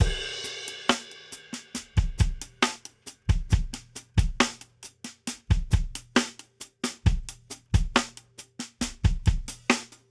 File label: Tight and Dry 68 bpm, drums; Basement drums vol 1